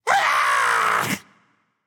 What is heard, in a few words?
growl; smite; xingtian